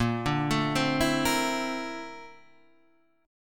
Bb Major Sharp 11th Bb down, chord; arpeggiated

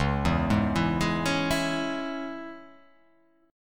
C sharp Minor, chord

